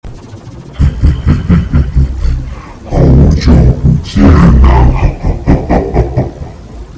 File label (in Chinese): 恐怖聲